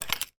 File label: household key car electronic pick up from sideboard